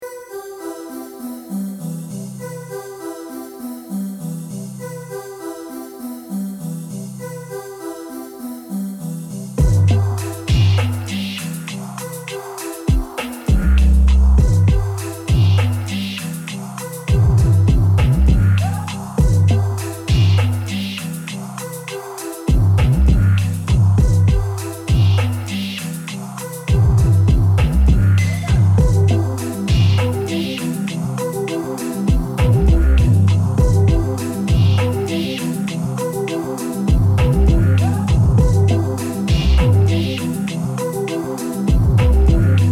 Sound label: New Age